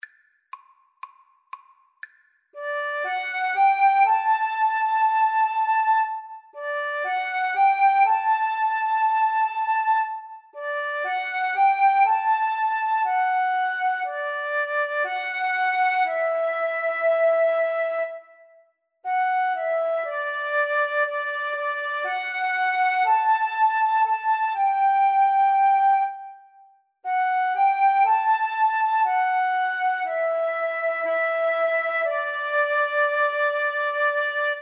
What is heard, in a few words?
when the saints flute guitar duet